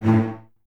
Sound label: Cellos Stacc, String Ensembles; Miroslav Vitous